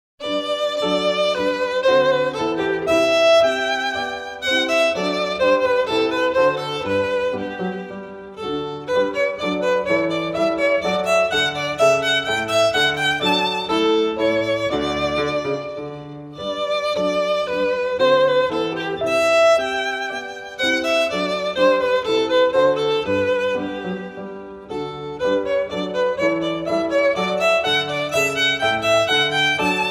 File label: Suzuki Violin Method, Bourrée, violin; Suzuki Violin Method - Vol 02; G.F. Handel